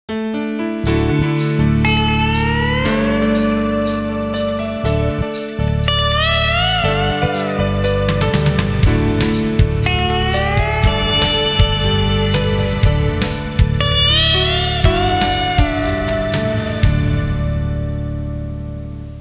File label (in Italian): suonerie musica hawaiian